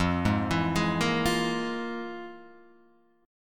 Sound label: F Minor Major 7th Double Flat 5th, F, Guitar, Half Step Down